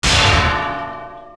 Ganon Smash; Zelda; OOT

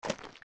steps; Jabu Jabu; zelda; OOT

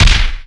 Roll Slam; Link slamming into a wall while rolling; Zelda

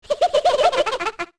Ocarina Laugh; Skull Kid laughing after playing the Ocarina

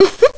Girl Laugh

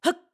Climb Jump; Link; BOTW; Breath of the Wild

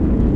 Elevator Loop; Fire Spirit Temple; Zelda; OOT

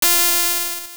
Shoot Homing Split; Second form's homing magic splitting into 4; NightmareShadow2

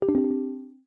Textbox Complete; Page of text complete

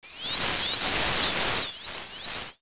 Phantom Ganon Float; OOT

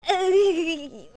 Young Landing; Link landing from a big fall

